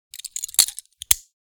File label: Prisoner handcuffs latch opening lever release click